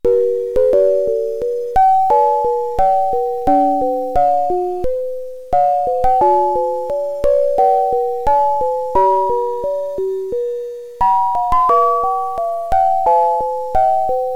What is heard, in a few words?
sine; mymelo